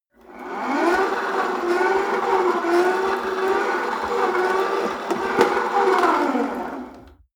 rope trainer pull sequence medium long, Fitnessstudio; sport freizeit